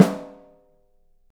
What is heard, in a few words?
OHSTSN L, 3D Drums